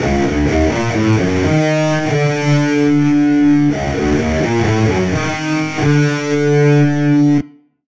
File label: Rock Guitar; Zystrix